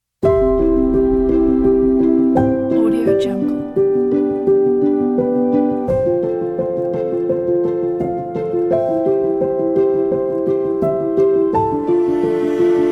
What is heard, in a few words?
wedding inspiration